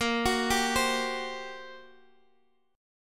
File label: G Minor Major 7th Double Flat 5th A sharp